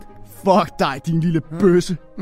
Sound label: yawn funny